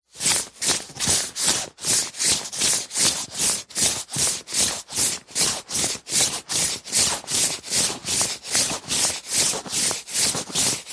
foley clothes jacket thick high vis walking fast movement; zapsplat